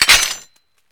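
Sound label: Weapon bear claw attack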